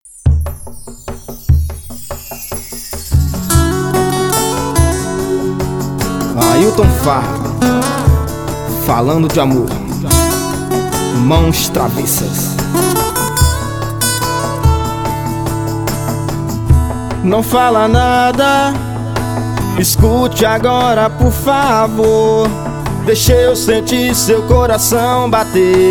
maos travessas acustico

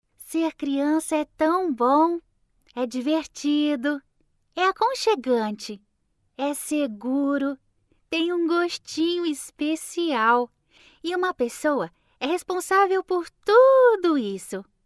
infantil

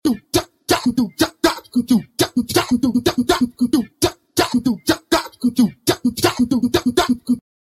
beat tum cha, Base De Funk

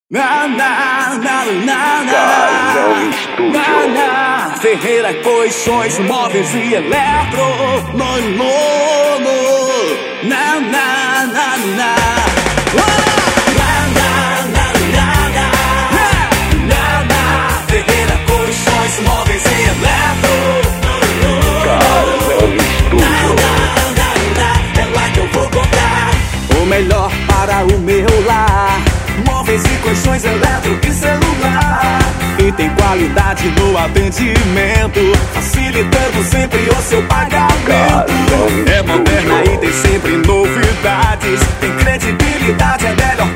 jingle ferreira colchões móveis & eletro; kazam studio

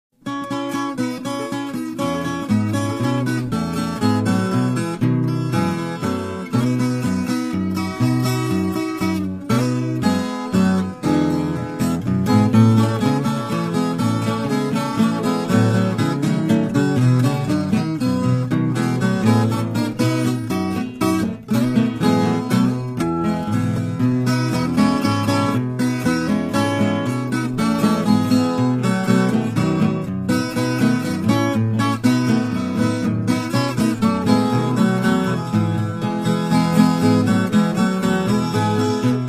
Trilha Instrumental De Viola Caipira, Viola Caipira

